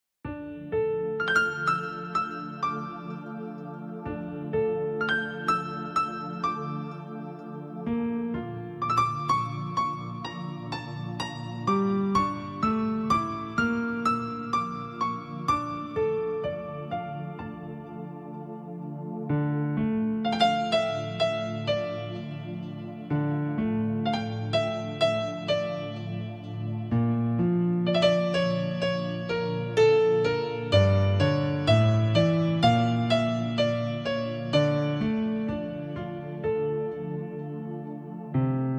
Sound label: Piano